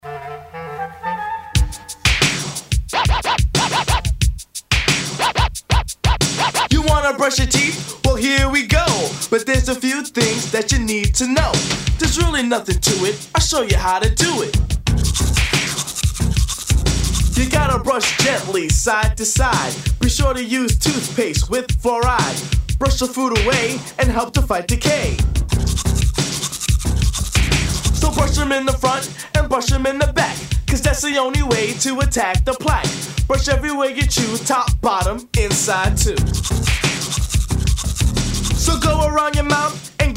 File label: rap; brush your teeth rap; oral health education